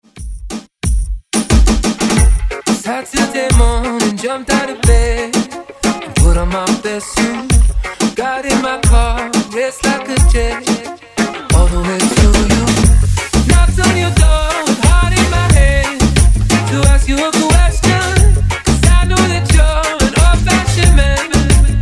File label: Reggaeton Redrum